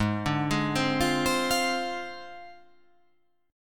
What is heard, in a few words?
Ab 6th Flat 5th, chord; arpeggiated; JGuitar